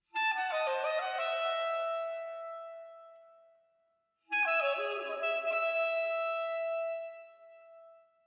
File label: Fanfare Clarinet